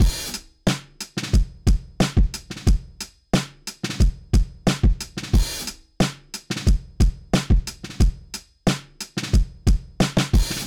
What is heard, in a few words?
drum groove plugin on; analog glow; tonalmark